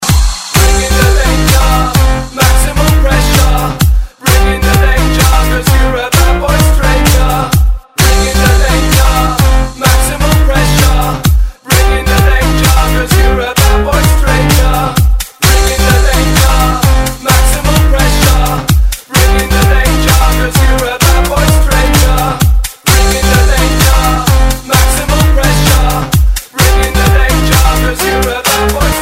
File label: Club Atmosphere